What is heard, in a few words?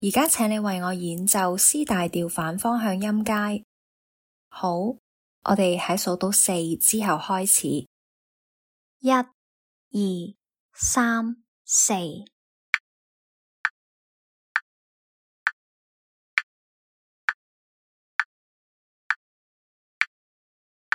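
c major contrary motion scale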